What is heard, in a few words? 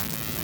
laser burn; weapon